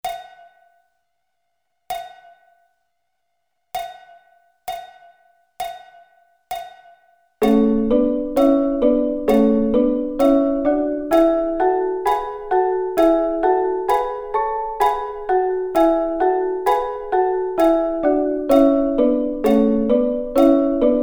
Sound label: A Double Stops